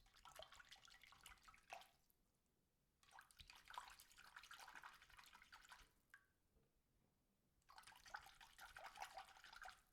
rinse razor in filled sink close

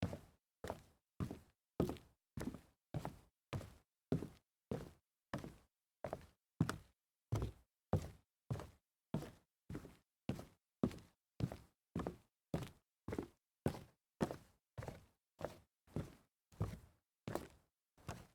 sneaker walk solid wood, foley

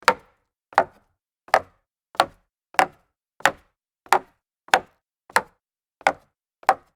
walk down solid wood stairs

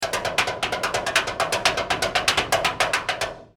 pound on big tin bin

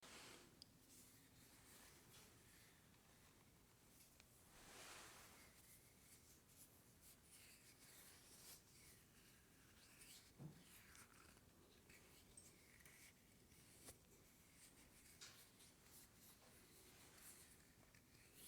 rubbing smooth object series

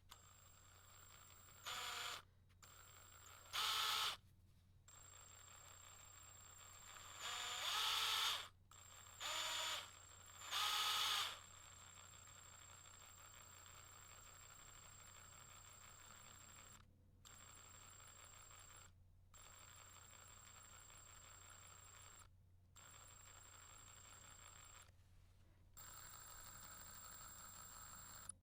medium drill burst series

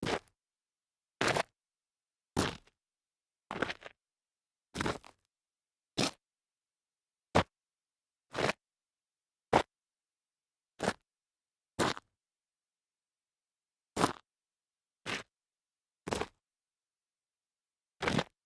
shoe scuff grit

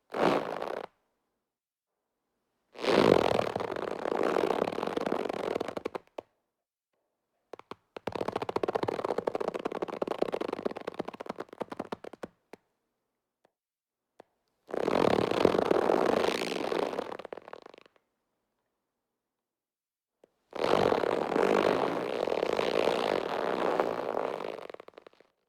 face stretch

